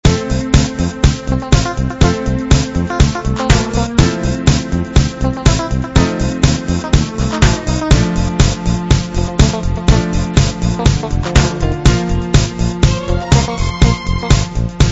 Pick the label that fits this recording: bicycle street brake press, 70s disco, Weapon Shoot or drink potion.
70s disco